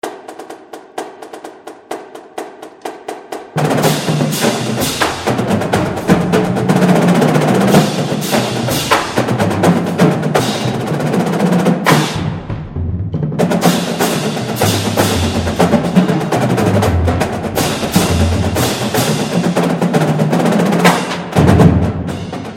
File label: Drum Line; DRUM CORP; University Of Massachusetts Drum Line